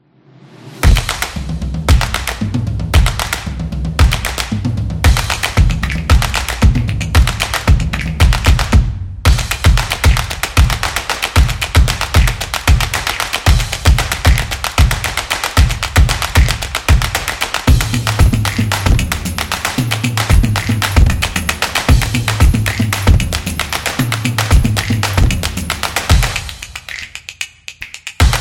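Hosting, Street percussion background music built for sport, motion, and dance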